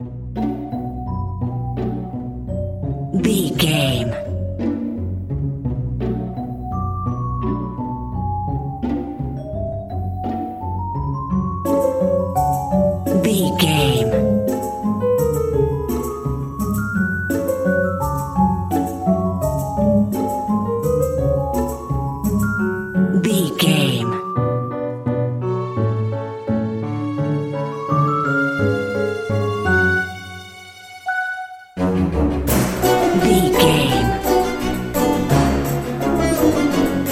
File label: clever shenanigans